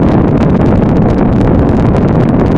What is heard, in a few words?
FIRE MBLS; forsaken